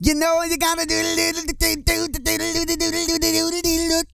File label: SING, Vocal Planet R&B & Dance; ILIO